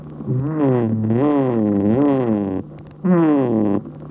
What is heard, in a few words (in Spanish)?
macho sirena triple; エンヤ ツノゼミ、オスの３拍子警笛; 録音 ヒメナ・ミランダ